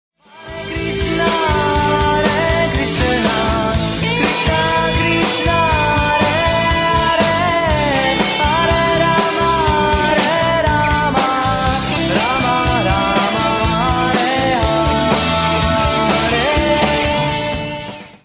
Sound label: Maha Mantra, Rock